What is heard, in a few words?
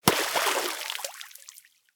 splash small; smite; poseidon